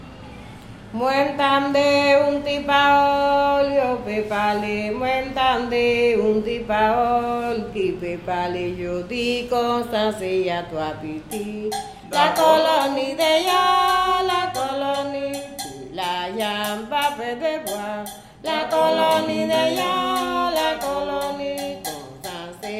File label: merengue